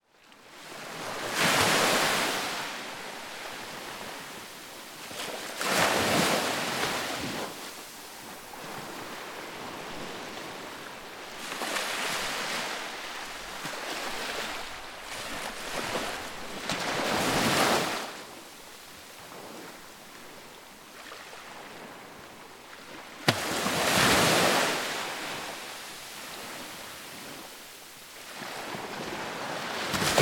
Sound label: nature ocean waves small break sandy beach bay cove tropical great barrier reef; zapsplat; kepple isla